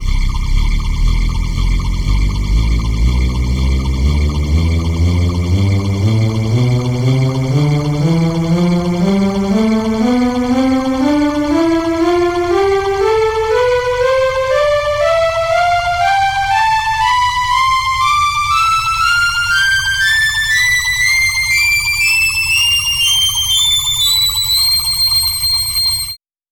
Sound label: sweep pad; tonleiter